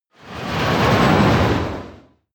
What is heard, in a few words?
military jet fighter flyby whoosh